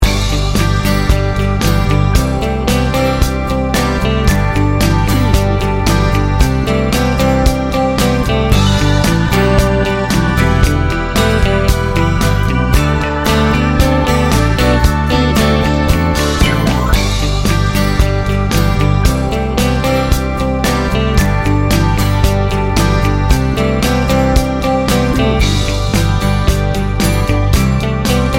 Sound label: laid back country